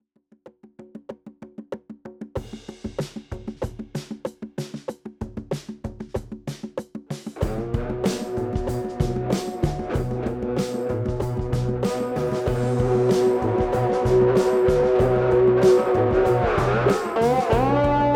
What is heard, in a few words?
Funky Minute